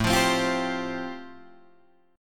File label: A-Minor 7th Sharp 5th, Guitar Drop D, chord